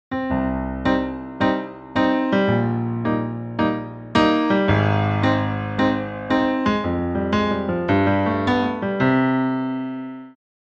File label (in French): impro piano; loto sonore